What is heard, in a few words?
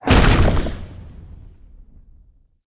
Grunt Swamp Cabbage Explo; Enemy; Combat; Characters; Corrupted Sproutfolk